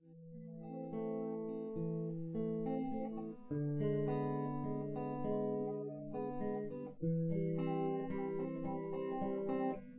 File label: nodrums synthconv 250ms 5cm